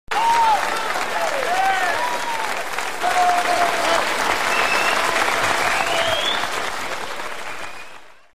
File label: Applause